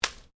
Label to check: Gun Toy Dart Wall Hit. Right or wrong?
Right